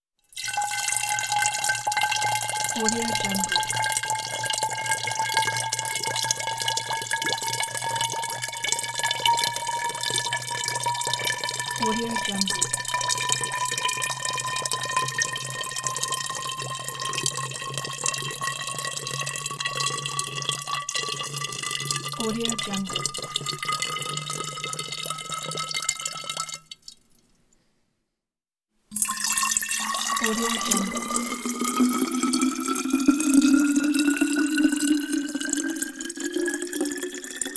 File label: water pouring in glass tank; sounddivision